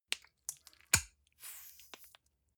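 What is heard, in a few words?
gel dispenser squish air, bathroom; haus garten; badezimmer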